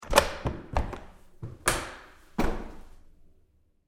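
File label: Door Bathroom Stall Open close-up Med with Lock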